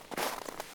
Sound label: walk snow